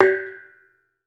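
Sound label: BONANG, Metalophones, Pitched Percussion; E-MU Producer Series Vol. 7 – Old World Instruments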